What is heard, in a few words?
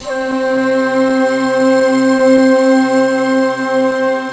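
C4, JAPAN FLUTE; Best Service; Advanced Media Trax Modern Composer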